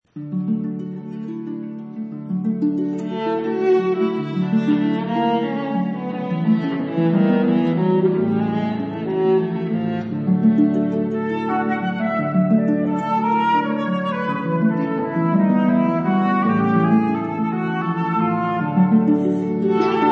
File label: sonata flute viola harp